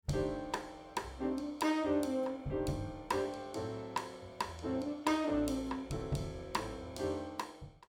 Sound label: Snare Meld Inv